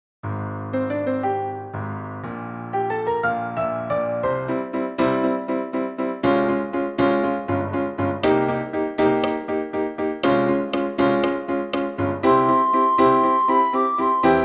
sopraan

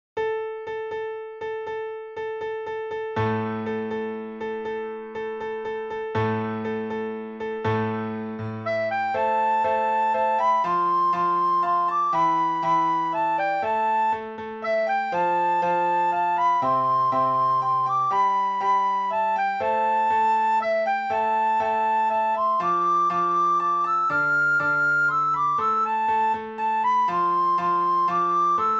sopraan; Blokfluitles in Utrecht

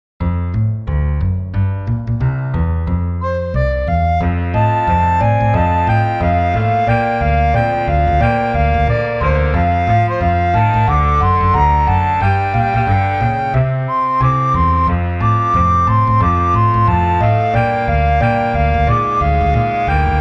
sopraan; Flierefluiter 5

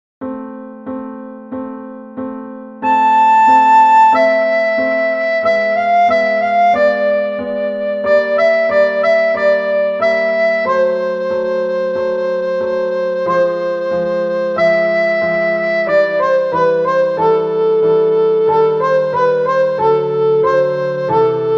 Andantino alt

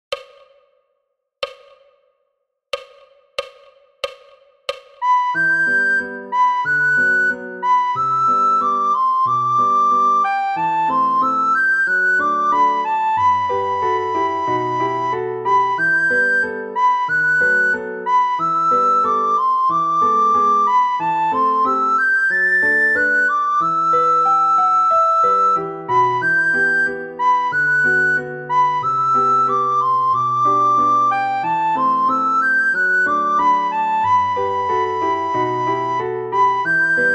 sopraan sneller; Kikis Delivery Service; Flierefluiter 7

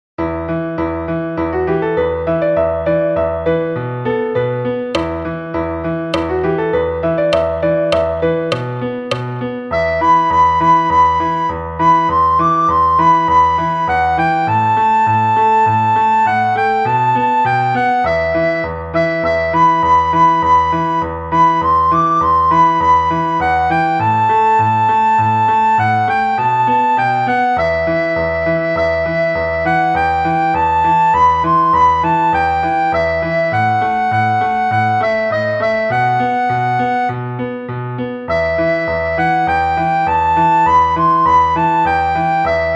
rustig tempo sopraan; Flierefluiter 6

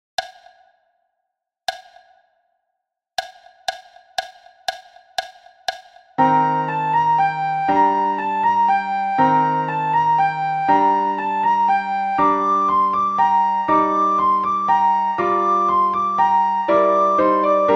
sopraan; Flierefluiter 6

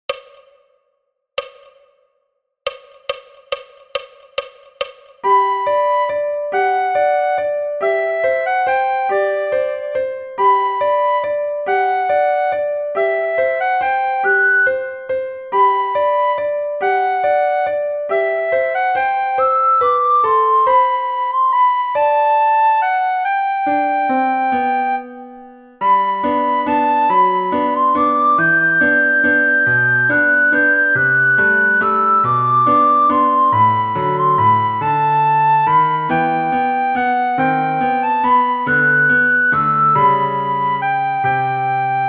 sopraan; Flierefluiter 6

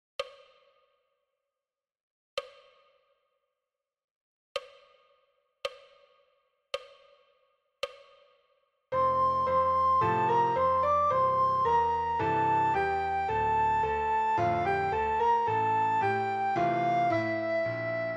sopraan; Flierefluiter 6

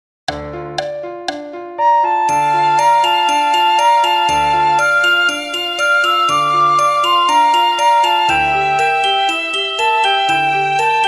sopraan; Flierefluiter 5; Blokfluitles Utrecht

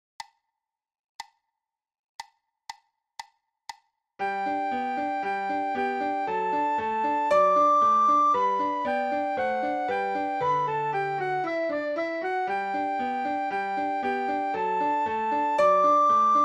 sopraan; James Hook; Blokfluitles

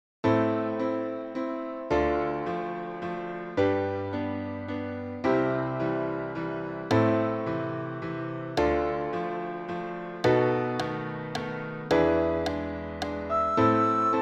sopraan; Flierefluiter 6

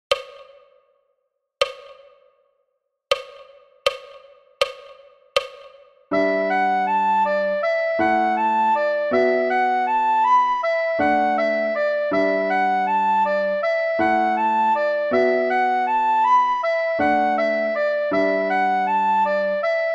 sopraan rustig; Flierefluiter 7

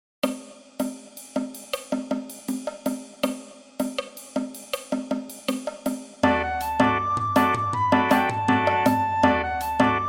sopraan snel

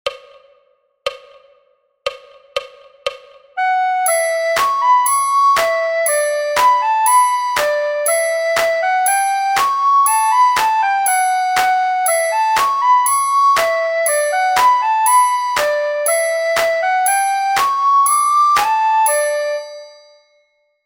sopraan; knipoog; Flierefluiter 6

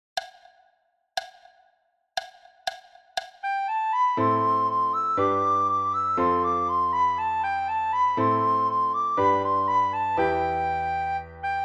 sopraan; Flierefluiter 6

